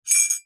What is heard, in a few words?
household cutlery teaspoon movement in coffee mug as if cup tipped while spoon in